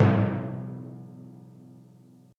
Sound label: timpani